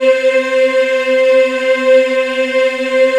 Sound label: C4 MW HI, choir; USB Soundscan vol.28; Acoustic & Synth; AKAI